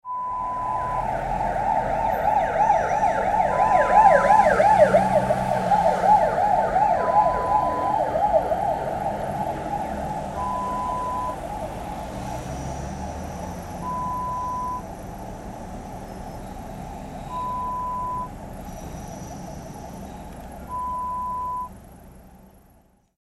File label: Siren sound